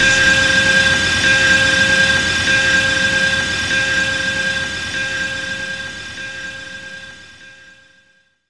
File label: Gene Drone; Jungle; Blu Mar Ten - Jungle Jungle - 1989 to 1999 Samplepack